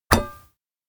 flick; aino

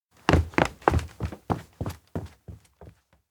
steps indoor school hall run away; chiharu; anemoi パッケージ版 1.1

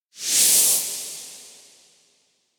elec flashback; anemoi

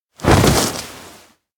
sit fall thud; anemoi

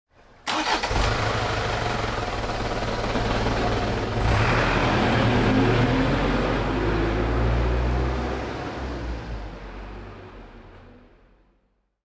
truck engine start move; anemoi

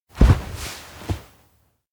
kigurumi off; anemoi

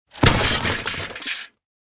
wall hit; anemoi

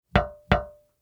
door knock metal; anemoi

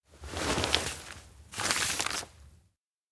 map take pocket spread desk; anemoi

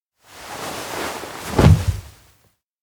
fall; anemoi

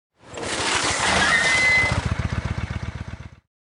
bike brake; anemoi

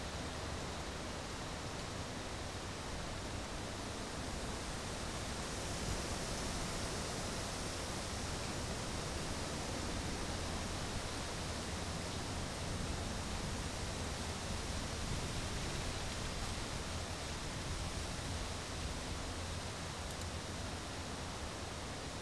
wind calm; anemoi

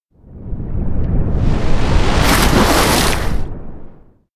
elec vortex; anemoi

